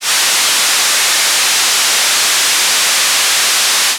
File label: Noise Blue